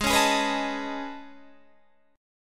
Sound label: A Major Sharp 11th, G sharp